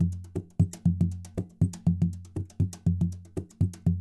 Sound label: NYC Percussionworks loops 120; Sampleheads